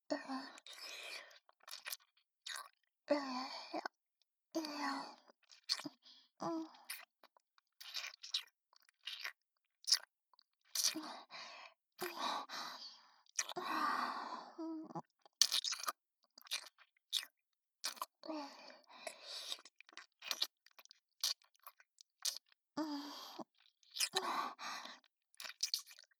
乳首舐め L; ルナ

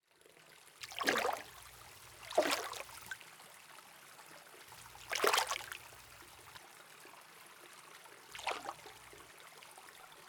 癒しのシャンプー

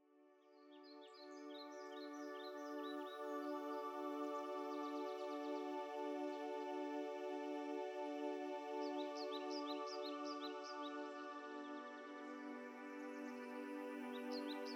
ヒーリングミュージック; 劇中BGM

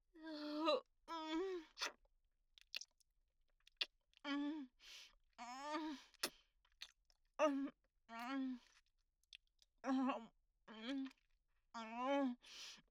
イラマチオ・抵抗しながらも恐怖に怯えながら男性器をぺろぺろと舐めている声; お色気お姉さん系カノジョ

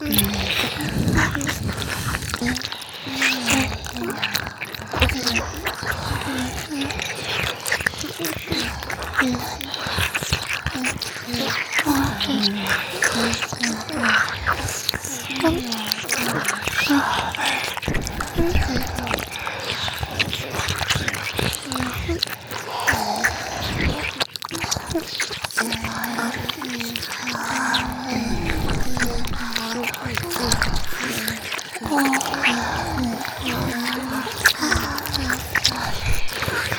四倍耳舐めループ

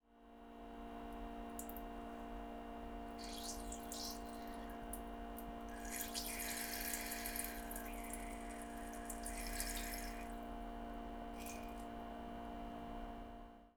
通常おしっこ音（声なし）

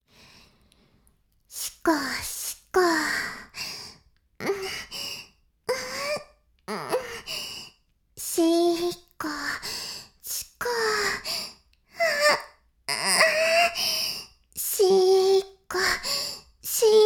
しこしこ音声, ASMR; 白くて黒い魔性円環

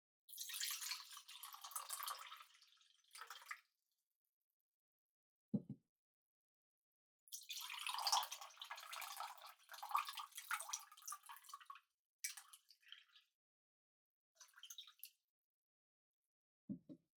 コップにお茶を注ぐ; 3DIO; 食事に関する音

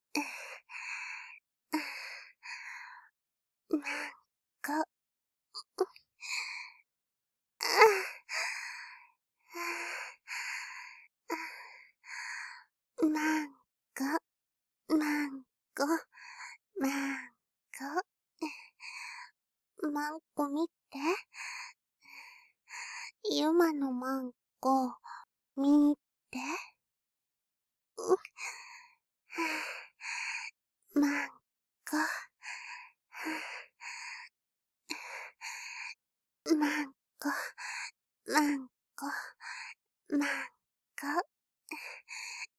喘ぎ; 由真

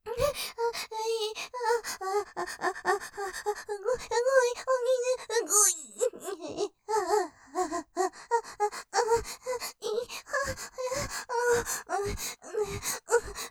喘ぎ, どんどん感じて・頭ふやふやに; ステレオ

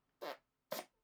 おなら; マニアック

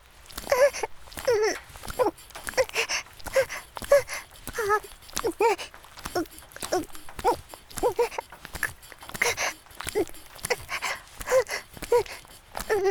雨降りバージョン ギシアンループ; 田舎の幼馴染のAV出演を手伝って…

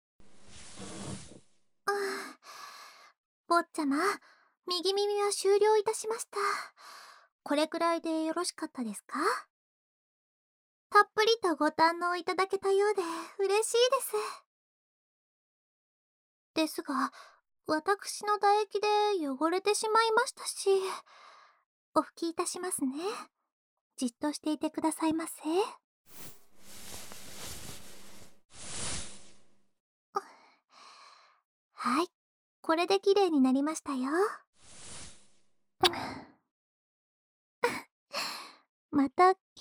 耳舐め左耳, ASMR

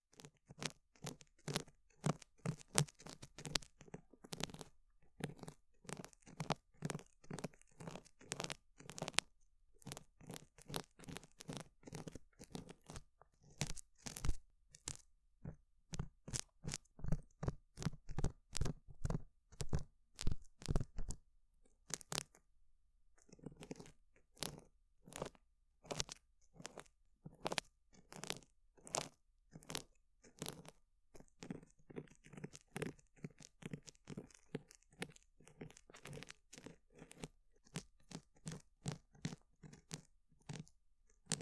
氷の耳かき(右氷耳かき); 雪女ならではの氷の耳かきを味わってみてください...

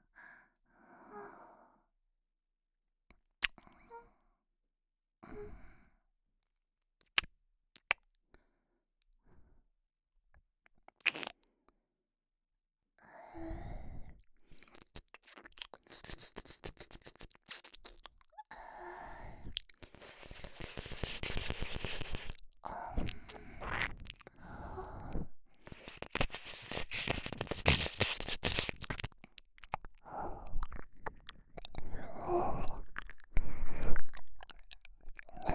耳舐め, ASMR; オナサポ

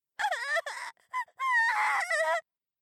うぁぁ…; 効果音なし

